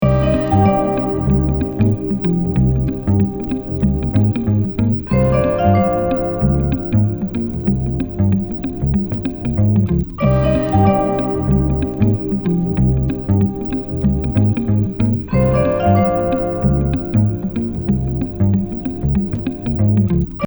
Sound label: Live FX dry